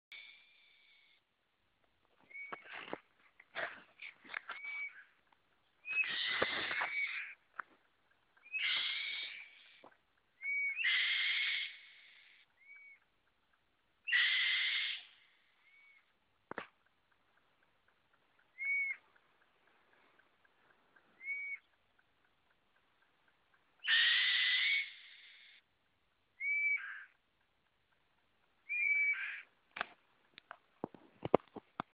Black-winged Kite

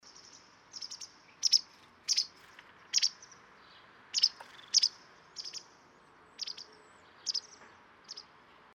White Wagtail

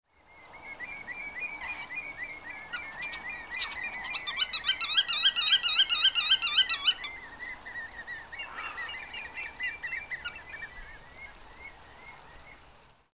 Black-tailed Godwit

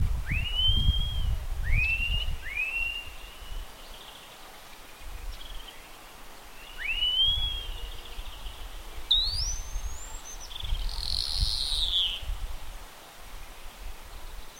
Spotless Starling

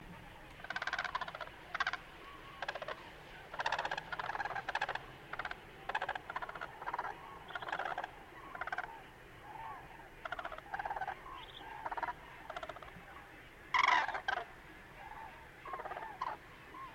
Crane

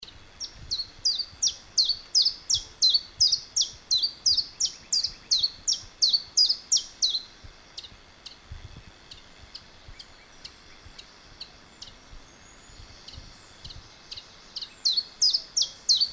Chiffchaff

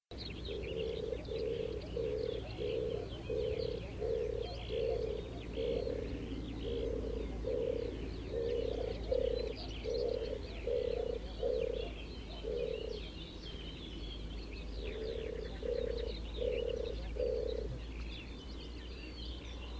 Turtle Dove

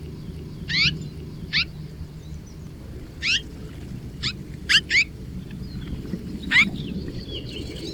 Red-rumped Swallow

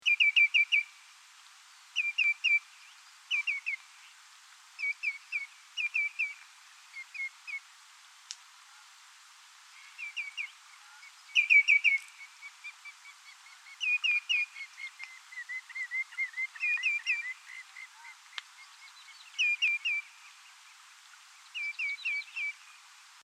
Greenshank

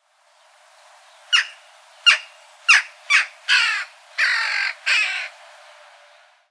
Jackdaw

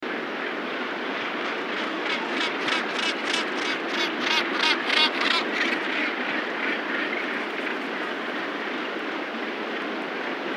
Gannet

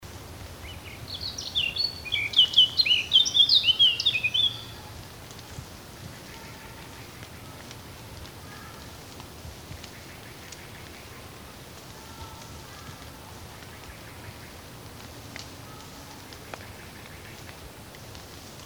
Blackcap

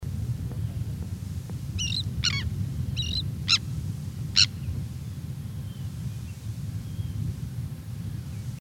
Sandwich Tern

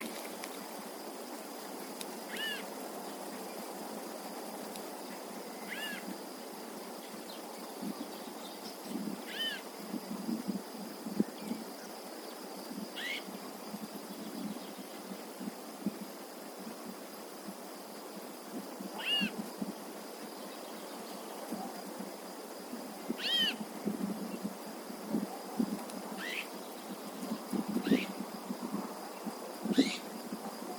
Azure-winged Magpie

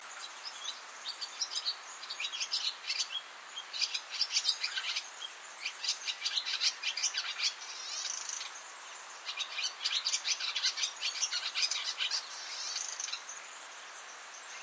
Barn Swallow

